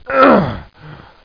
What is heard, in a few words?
grunt